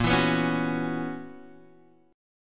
B Major 7th Suspended 2nd Suspended 4th Bb, chord shape